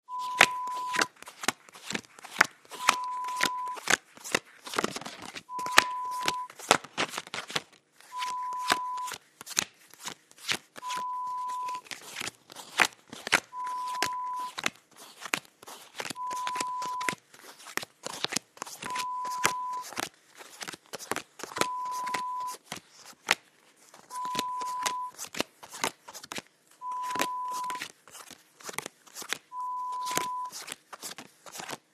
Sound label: Dealing Cards